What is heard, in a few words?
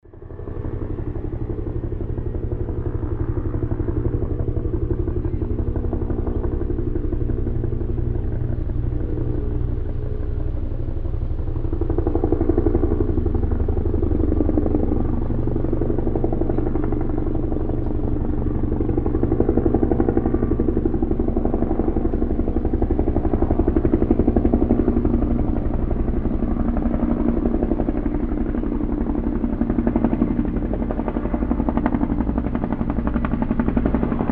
helicopter flying over lake